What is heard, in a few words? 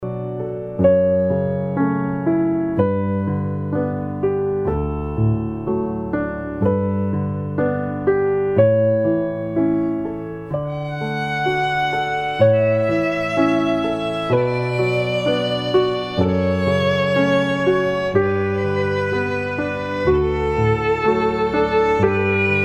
harp Strings